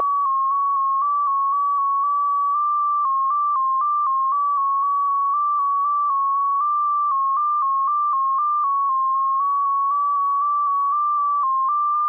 DominoEX 4Bd

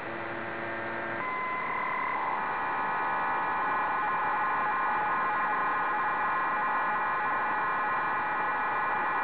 4xPSK-75 1xFSK-150

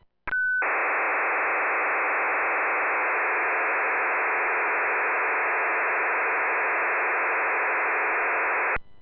RFSM 2000Bd PILOT, RFSM 2000 PSK waveform; RFSM 2000 with 500bps, long interleaver and 1500Hz pilot at burst intro; RFSM 2000 Bd SERIAL TONE WAVEFORM; PSK-systems

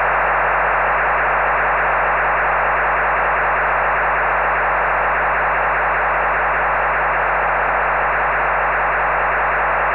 CZECH DIPLO 2400 Bd (SERIAL), PSK; (Continuous mode, modified MIL-STD 188-110A)

